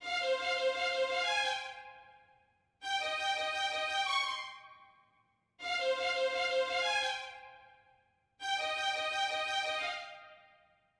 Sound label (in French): violons